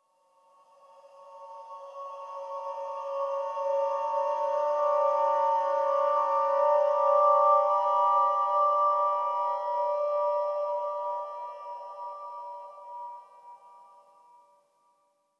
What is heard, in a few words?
Water Girl Above Water Horror; inside